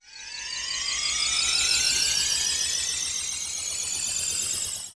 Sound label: lose; Rupee-Collectors